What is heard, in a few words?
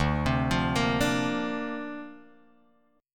D-Minor 7th Sharp 5th D Guitar Drop D, chord